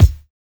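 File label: lofi kick; Drums In Your FACE!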